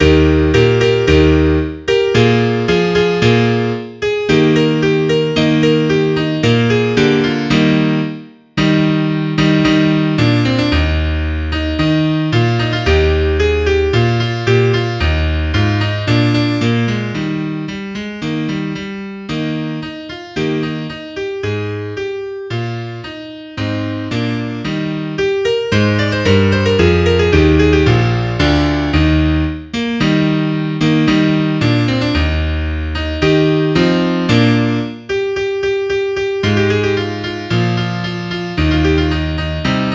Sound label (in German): fanfare; solo mit klavier; musik zur weihnachtszeit